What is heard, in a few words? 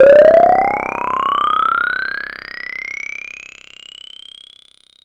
Froggy